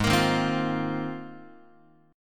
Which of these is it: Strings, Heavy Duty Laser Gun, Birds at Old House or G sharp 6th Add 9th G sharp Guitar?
G sharp 6th Add 9th G sharp Guitar